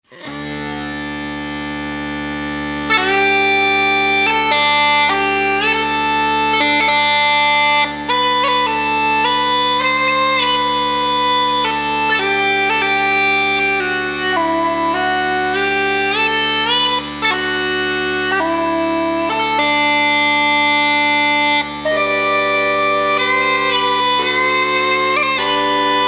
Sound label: Adeste Fideles Instrumental